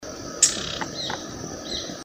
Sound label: Synallaxis ruficapilla; Parque Municipal dos Sabias Sao Jose; Jorge La Grotteria